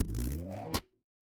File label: cartoon stretch